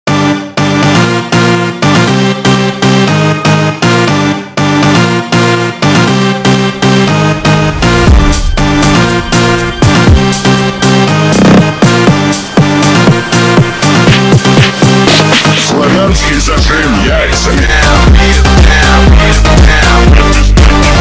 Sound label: Phonk Remix